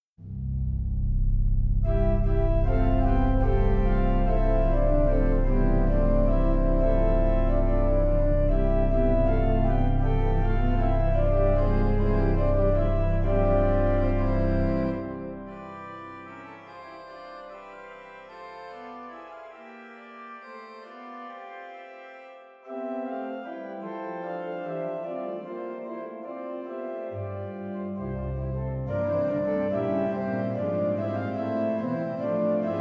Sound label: grandorgue hymne